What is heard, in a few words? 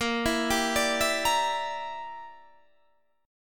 A sharp 6th Flat 5th, Guitar Standard; A#6b5 arpeggiated; JGuitar